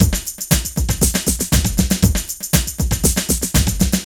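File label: drum loops, right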